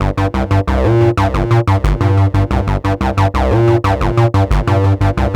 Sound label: 303 Synth, Arpeggios; Synths; Electronic Point Blank